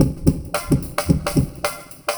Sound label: LOOP, 70's Breakbeats; USB Soundscan vol.46; AKAI